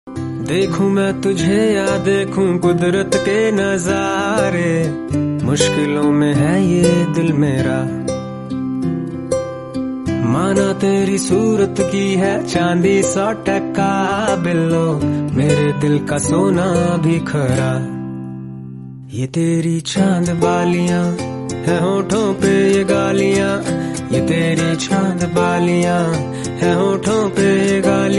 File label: Hindi Song